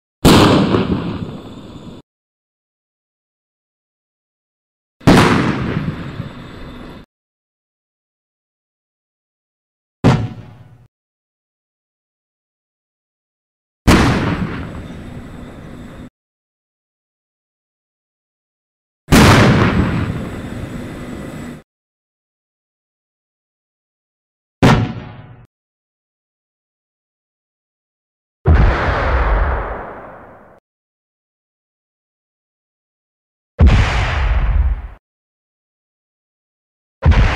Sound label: Tank Shell Fire